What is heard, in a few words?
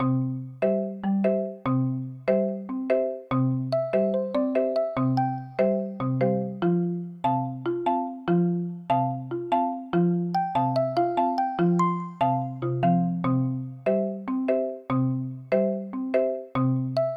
bgm; coreplay